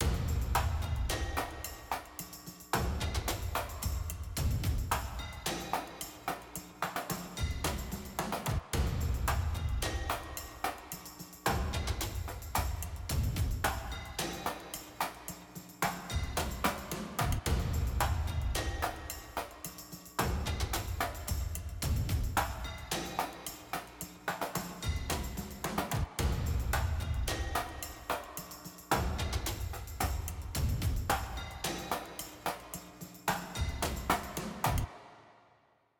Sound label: Creep Low